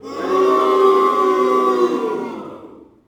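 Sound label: boxing crowd small boo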